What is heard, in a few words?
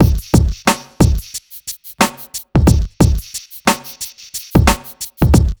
BEAT, Burning Grunge Hip Hop